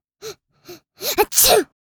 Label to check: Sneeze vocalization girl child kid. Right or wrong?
Right